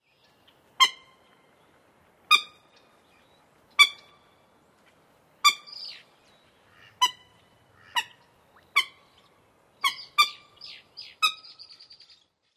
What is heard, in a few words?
keek calls given near nest